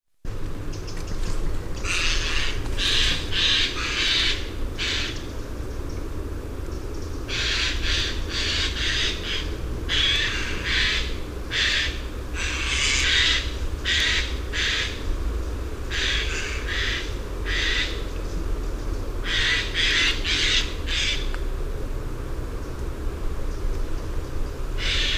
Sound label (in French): Geai des chenes, chants